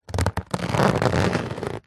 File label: paper bag large hand squeeze creak